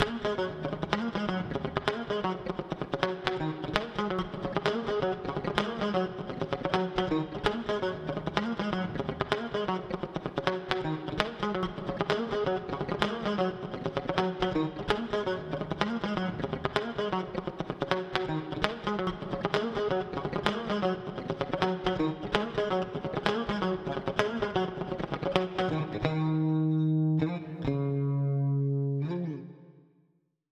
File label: PIGEON FUNK